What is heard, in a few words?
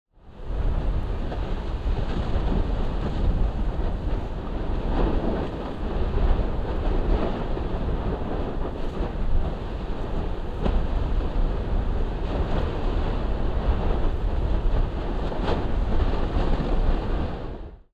volcanic wind